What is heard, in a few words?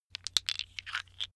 tiles wood rattle; objekte; holz